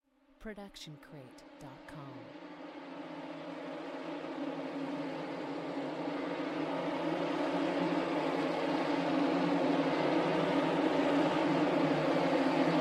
string swell clusters; soundscrate

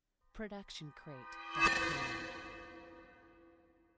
metallic reverse; soundscrate

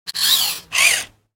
camera zoom

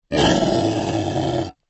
dinosaur low growl; SoundsCrate

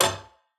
robotic click; soundscrate

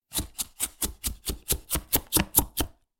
Mid Chopping; soundscrate

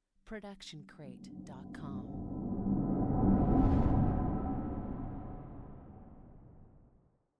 Low Woosh; soundscrate

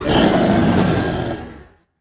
big dino roar

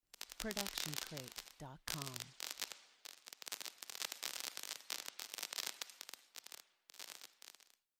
electricity sparks; SoundsCrate

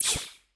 anime hit

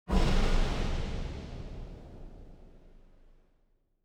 Wind Blast Explosion

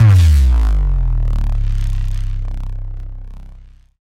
Bass Drop; SoundsCrate

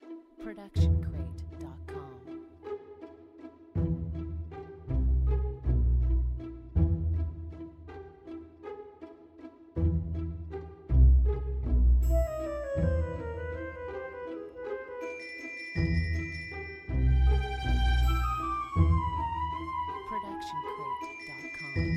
forest critters; soundscrate

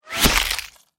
body bullet hit

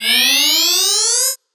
scifi weapon power up mid

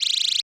Bleep Charge Up; SoundsCrate

